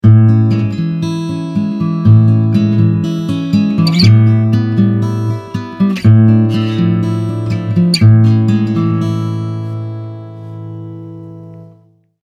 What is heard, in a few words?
Blueridge BR 43 Finger